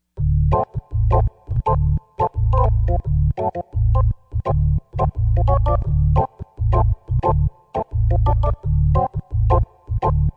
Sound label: Warm Organ, Yamaha TG55; Factory Presets